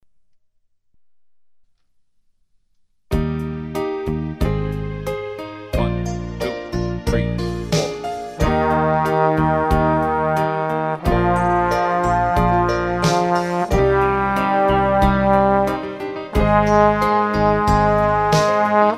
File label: Scale Up